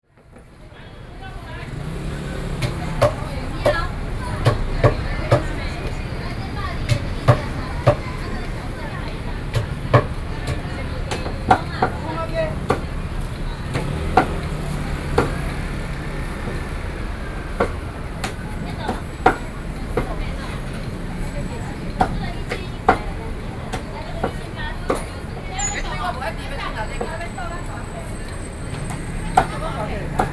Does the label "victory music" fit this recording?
No